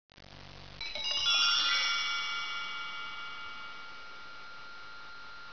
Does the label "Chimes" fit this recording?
Yes